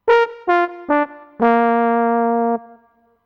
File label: trombone short